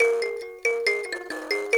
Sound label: Buzz Kalimba; Roland LCDP11 Africa VOL-1